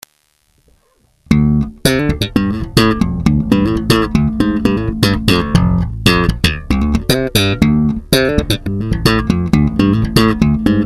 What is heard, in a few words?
funky